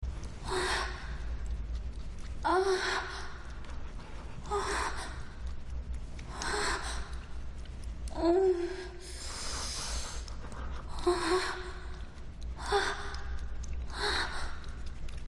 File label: moan, moans; The Witcher Hunt